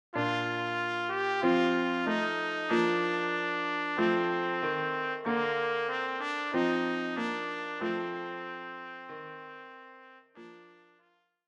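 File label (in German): Alle Jahre wieder Trompete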